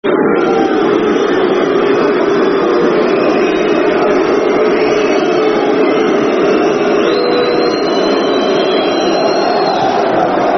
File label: Boo [Football Chants (Soccer Songs)]; fanchants; Ipswich Town Itfc